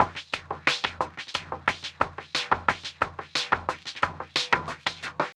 Band Pan, Drums & Percussion, Electronic; Sonic Foundry (Sony Creative Software) - Electronic Point Blank

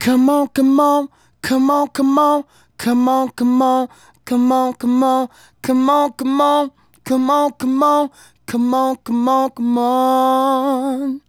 Male Solo, Hip-Hop and RnB Vocals

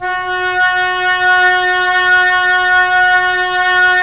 WND OB P, Oboe p; Roland - LA Composer vol. 4

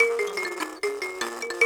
Buzz Kalimba; Roland LCDP11 Africa VOL-1

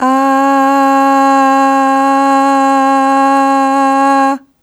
Aah Long C#3 Dry; Harmonic Building Blocks

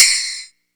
shaker hit; Sonic Foundry (Sony Creative Software); Ugly Remnants VOL-2; Beatstuff

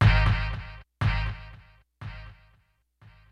HIPHOP HORNZ; Kid Nepro - Elite

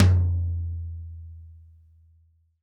TD-20 Tom A, Roland TD-20 Toms

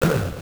Voice COUGH; Genesis

